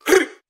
"huh"

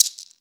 Shaker

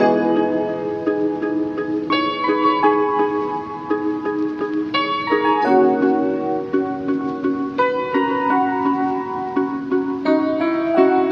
keys; Phonky

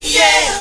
"yeah" sung

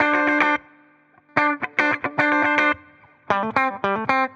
guitar join in and dance; Loops; Phonky

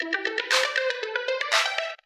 perfect beat, loops electronic

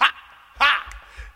ha ha

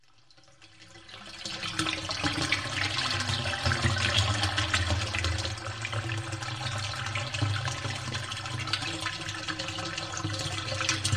peeing

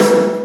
Snares Soft

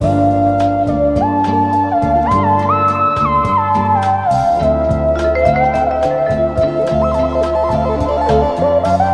keys, Phonky

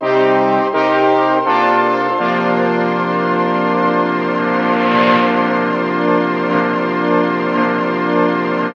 horns the fanfares, phonky loops

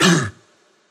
heh, Voice; ooh ahh

